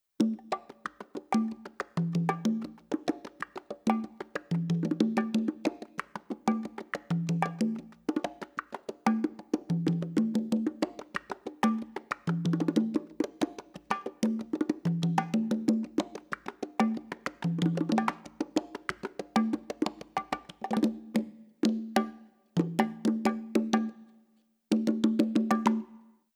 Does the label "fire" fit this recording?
No